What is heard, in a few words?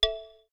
Volume, ui